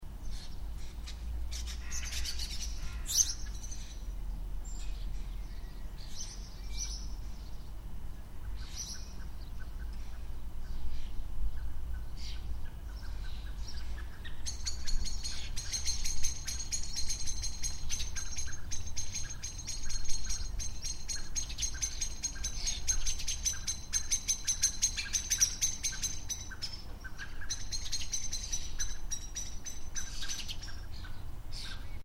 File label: Garden (Residential): Ambience, Birds Prominent, Slight Road In Background; Outdoors; Backgrounds; Outdoor Sound Effects; Stuart Duffield